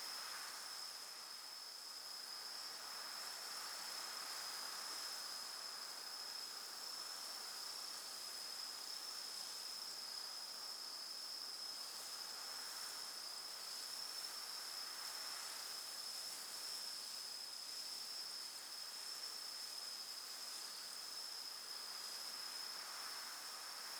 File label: ambience field night calm dry; Rust